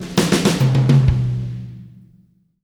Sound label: Fills, Monster Beats; Zero-G